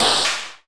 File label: SOUND EFFECT, Metal; AKAI S6000 CD-ROM - Volume 1